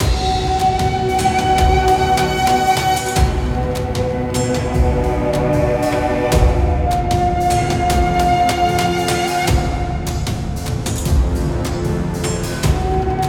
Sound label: Loop